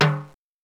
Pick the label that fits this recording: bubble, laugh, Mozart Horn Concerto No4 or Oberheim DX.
Oberheim DX